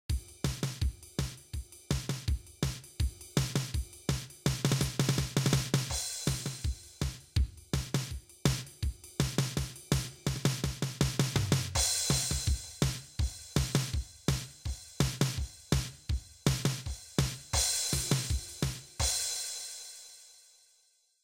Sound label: drums only; MIDI